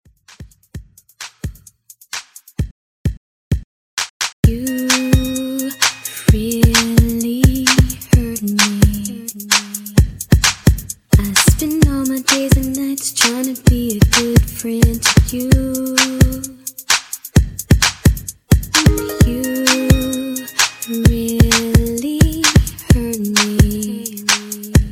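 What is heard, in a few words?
extend intro 130 bpm G min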